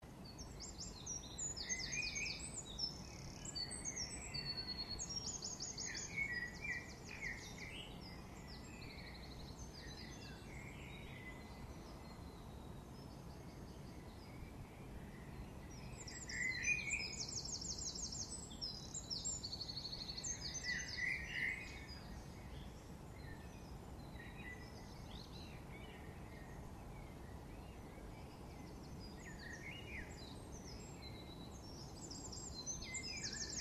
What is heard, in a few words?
stereo birdsong from dawn chorus, west London window